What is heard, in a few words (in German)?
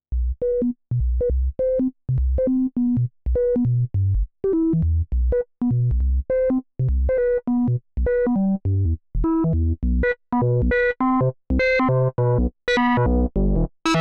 Binson Echorec Synth ohne FX; T-REX